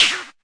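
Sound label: mbrute snare, Arturia MiniBrute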